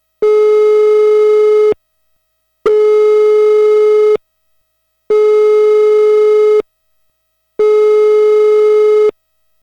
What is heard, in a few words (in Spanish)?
tono de llamada telefonica saliente